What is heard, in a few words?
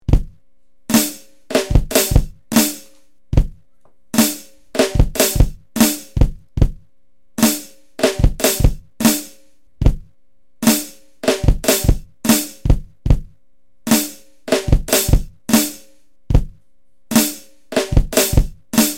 drum scratch